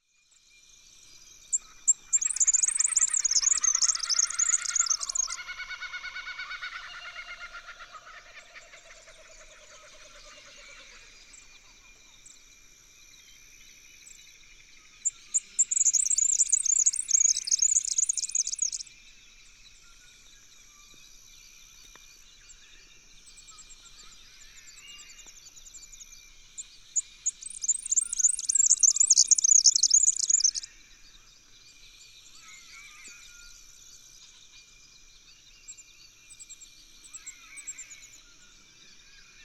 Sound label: Red-backed Fairy-wren